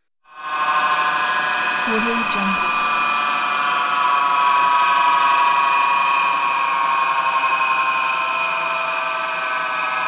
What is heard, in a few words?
motor winddown